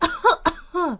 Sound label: coughing; peach; SM64 CO-OP